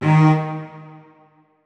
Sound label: notes, keyboard; Hideout Furniture